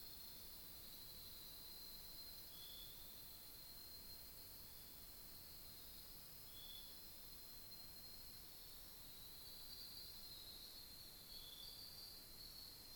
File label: Nocturnal forest New Caledonia